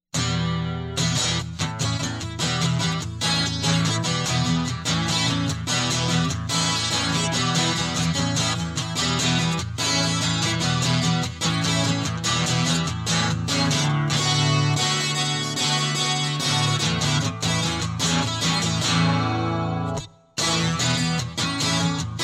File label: Guitar Loop